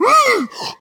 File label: Zombie Scared